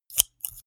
scissors open close

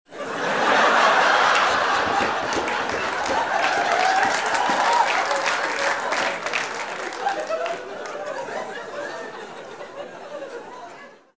laugh applause table

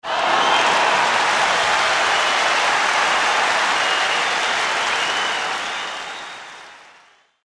big stadion applause large

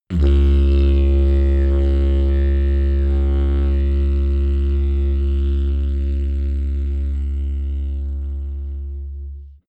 didgeridoo straight tone

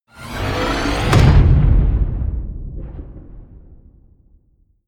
promo pad

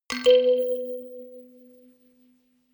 notification kalimba rattle octave up

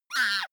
child shriek

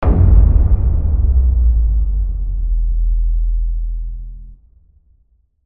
hit to sub

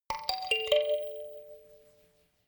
notification kalimba rattle sequence major

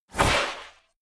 scrape

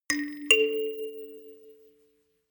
notification kalimba rattle fifth up

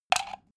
socket plug

